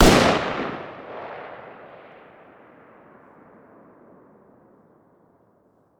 fire dist 556x45 mg ext; gmod; arccw uc commons attachments

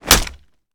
punch gun wall; gmod; arccw uc commons attachments

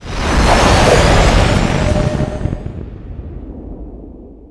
boost; gmod; lvs star wars; vwing

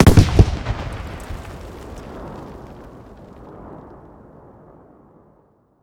med close, explosions; gmod; lvs framework

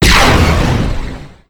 fire cannon; gmod; sw vehicle lfs at te; kingpommes; starwars

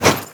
fireaxe light; tfa starwars melee weapons pack

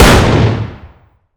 fire dist 50ae pistol int; gmod; arccw uc commons attachments

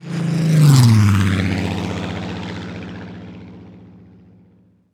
flyby, stuka; gmod; lvs planes

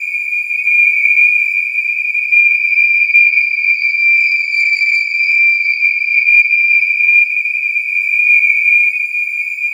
engine compressor, bf109; planes; gmod; lvs planes

